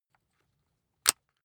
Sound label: rock drill head attach soft; maschinen; bohrer; soundpool